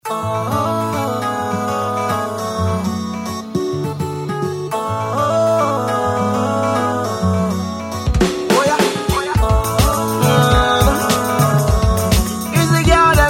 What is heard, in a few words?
beat remake